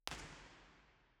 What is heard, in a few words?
First IR Sala 2800 Genelec Center Binaural; Santa Cecilia Roma; Measurements 2005; Soundfield